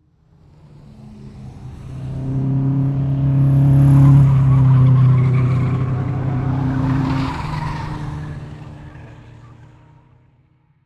Transportation Car Race Car Drive By Multiple Distant 180D